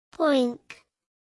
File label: pig